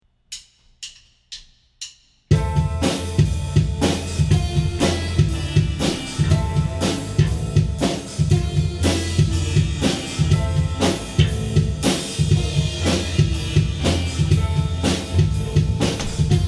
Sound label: Instrumental; rockbandacademy